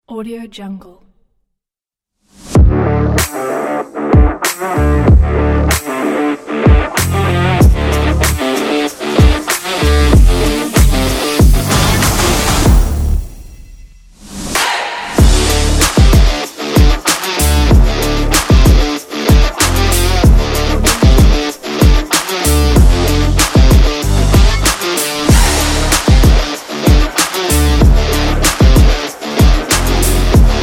stylish sport

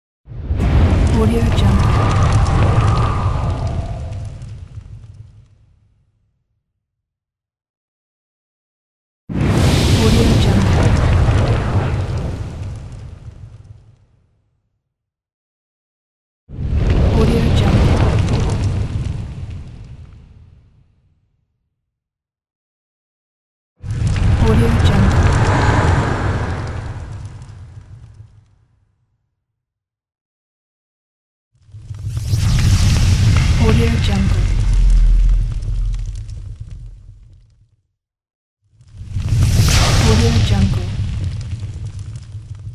fire whoosh pack; infinity sfx productions

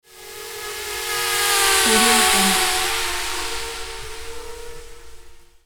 little drone flyby; yevhen lokhmatov

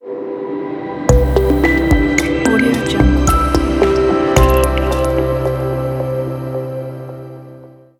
intro logo sound

